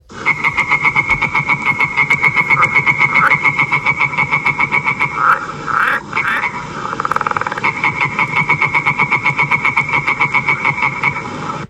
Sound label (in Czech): Rosnicka zelena; zabi; zabi stezky